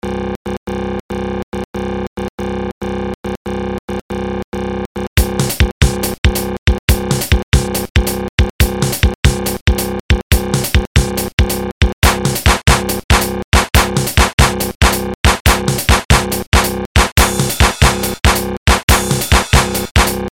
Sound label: String Mix